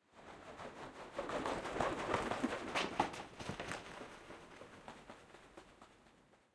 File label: barren, lake